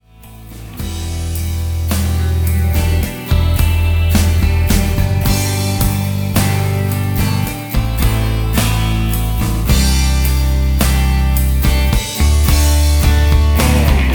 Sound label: Professional soundtrack